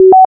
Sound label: hex beep on; svencoop